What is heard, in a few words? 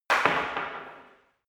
staff wood drop